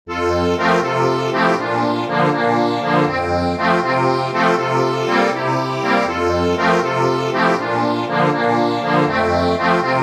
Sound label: ACCORDION